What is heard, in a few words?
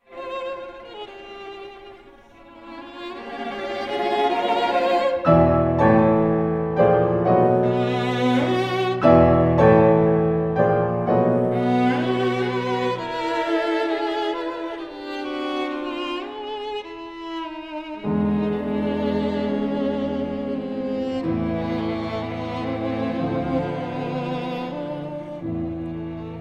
quartet for piano and strings; nash ensemble joaqun turina; op 67 in a minor 2nd movement vivo